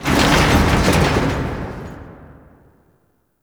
Metal Glass Rattle; Ambience; Props; Warframe; Entrati

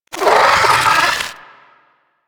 Amalgam Moa Satyr Vocal Attack; Warframe

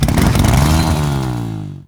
Infested Hammer Accent Rev, Weapons

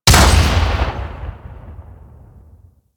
Shrapnel Explosion, Fortress Gameplay; Ambience; Warframe; Grineer Fortress

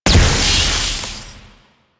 Wave Ability Ice Proj By Explosion; Enemies; Abilities; Warframe; Duviri; Dax Knight

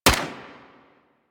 Sundial SMG Shot, weapons; Warframe; Tenno

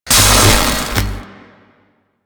Void Dragon Ring Sparks; Enemies; Warframe; Duviri

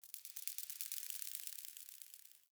Day Insect Close; Ambience; One Offs; Glades Of Andurin

